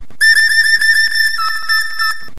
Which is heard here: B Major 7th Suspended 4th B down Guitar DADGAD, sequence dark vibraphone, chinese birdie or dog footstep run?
chinese birdie